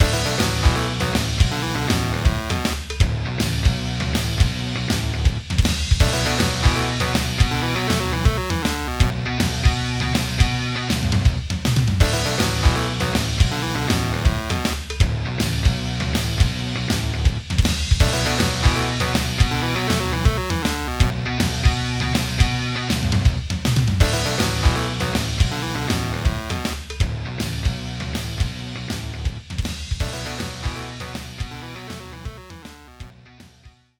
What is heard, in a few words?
3d Music